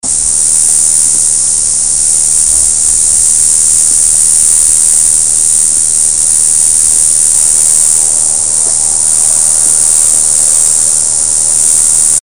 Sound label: cicada